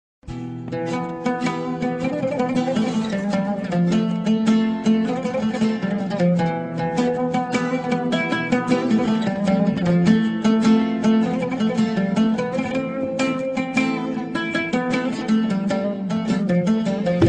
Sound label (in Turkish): Telefon Zil Sesleri Acıklı Efkarlandıran Telefon Zil Sesi